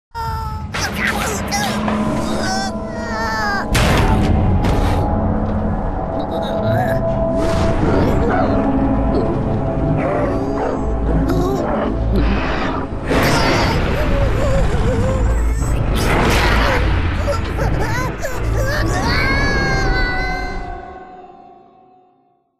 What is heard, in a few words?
Scrab Scare; oddworld abe s oddysee new n tasty